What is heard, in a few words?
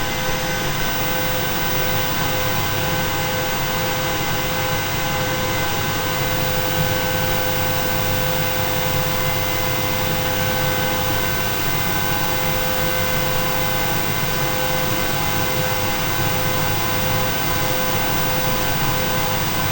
Transformer Studio